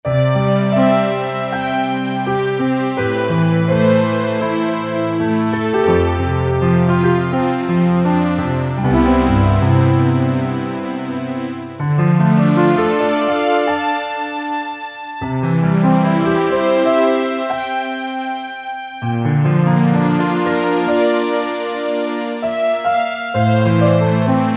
Piano + Strings, Casio CT-370; Tones

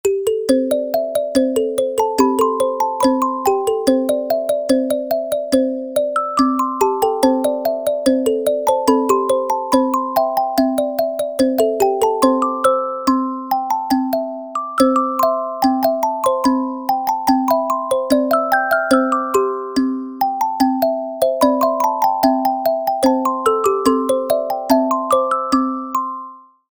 Kalimba

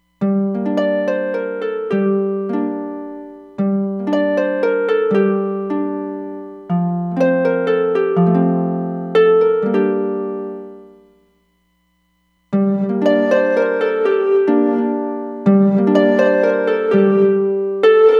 Reverse Bounce, Roland DEP-5; Factory Patches

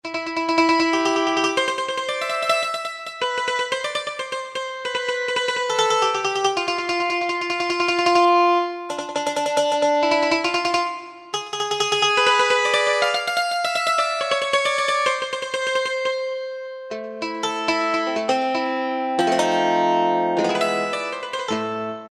Mandolin, Korg T3

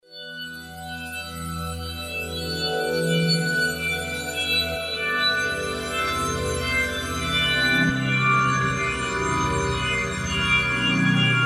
Touch Return, Roland V-Synth

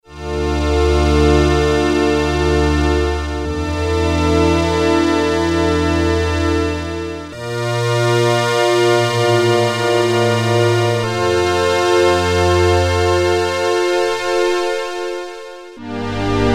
Slow Strings, Prophet-5; Sequential Circuits Prophet-5 (Ver.3); Factory Patch; File 2 Programs

